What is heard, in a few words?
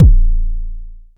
analog tonal, Kickbank